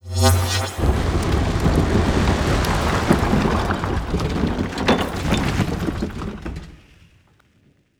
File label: insert pyramide